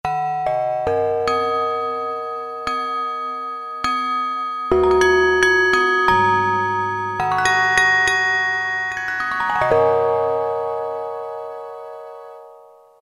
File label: Tube Bell; Roland MT-32